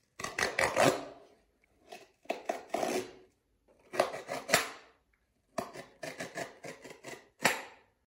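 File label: zvuk rejushego kanc noja, sound of a cutting utility knife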